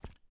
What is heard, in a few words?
half jump